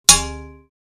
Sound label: Sword fight metal shield or chain armour hit